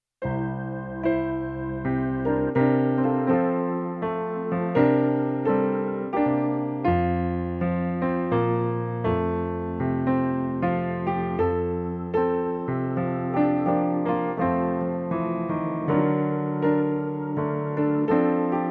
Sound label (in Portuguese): playback hino